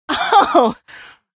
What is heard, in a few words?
laugh; episodic; episode 1; Source engine